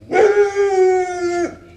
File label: dog bark close; ambient; creatures; Counter-Strike Global Offensive